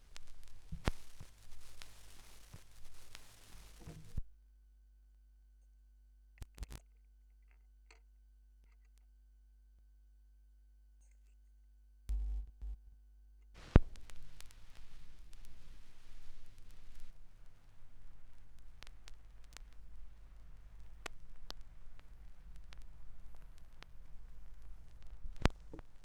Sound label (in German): schallplattengeraeusche, 45RPM